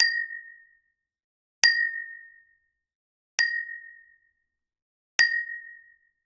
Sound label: Generic Click Glass Small